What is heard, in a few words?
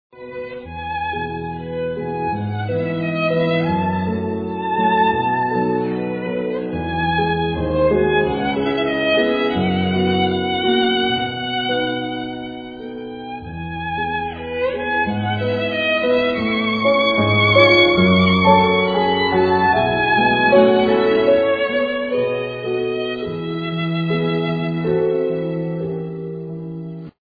violino